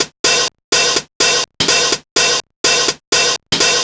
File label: drum hat 125; Classic Chicago House; Drum Loops